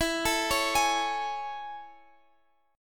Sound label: A Major 7th E